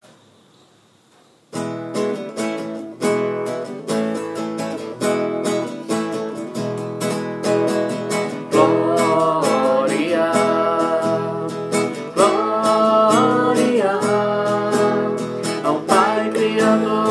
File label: vocal